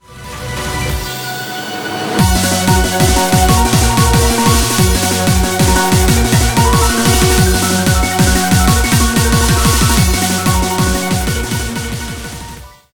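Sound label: background music crossfade; Invaxion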